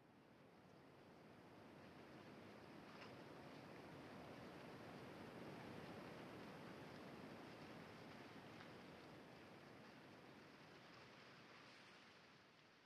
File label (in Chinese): 绯红森林粒子声; ambient